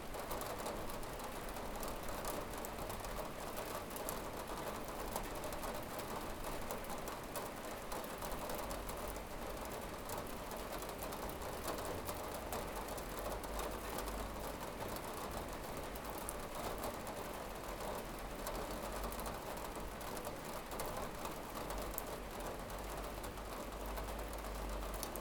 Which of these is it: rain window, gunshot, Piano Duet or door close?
rain window